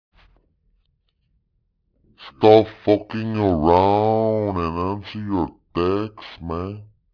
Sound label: Slow Motion